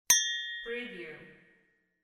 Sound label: glass clink